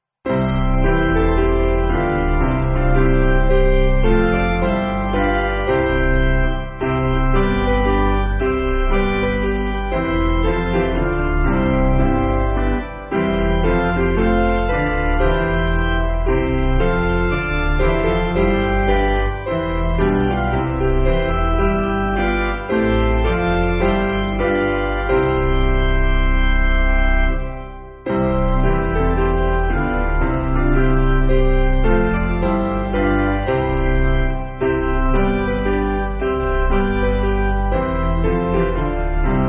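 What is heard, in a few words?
Organ Piano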